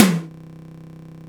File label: Room Tom Low